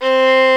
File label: STR XVLN, Str Quartet; Viola&Violn